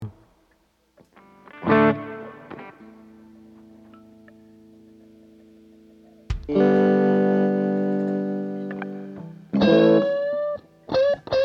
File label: Strings Emphasis